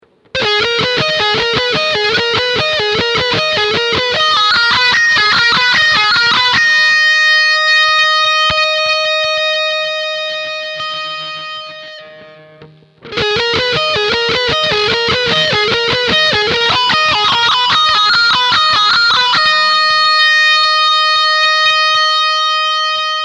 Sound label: WAH GT; evh 5150